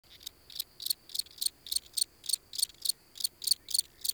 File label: Insect Close; Warframe; Eidolon Plains; Ambience